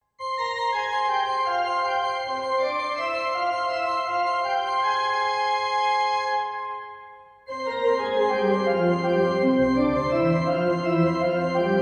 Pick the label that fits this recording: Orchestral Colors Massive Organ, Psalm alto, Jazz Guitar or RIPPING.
Orchestral Colors Massive Organ